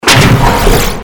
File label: evil die; CAC ASSETS